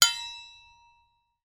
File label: household silverware impact hit